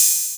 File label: 808 OHH, BossDR660 oh